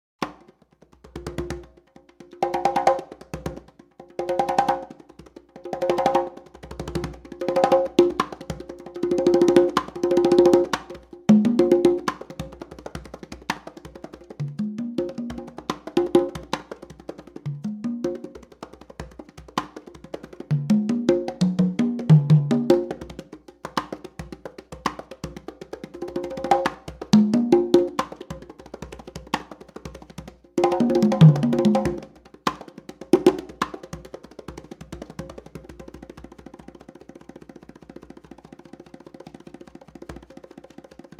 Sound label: conga intro